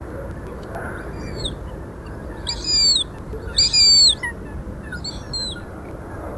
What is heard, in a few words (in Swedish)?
Bla karrhok